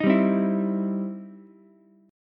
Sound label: E Minor Major 7th Sharp 5th, E, down, Ukulele; EmM7#5 strummed